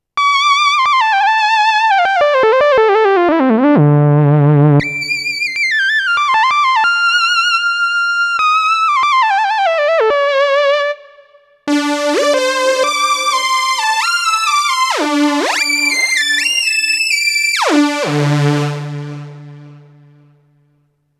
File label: synth lead; kurzweil pc361